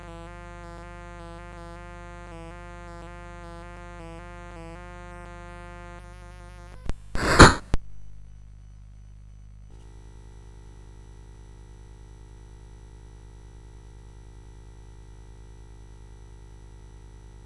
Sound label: Thwapp, CSG8580R5; Sound Effects